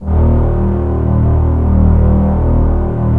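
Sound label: M1 STRING D#1; Northstar - New Gold VOL-1; M1+D50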